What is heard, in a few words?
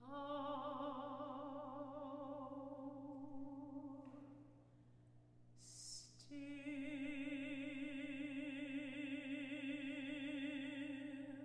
sea calm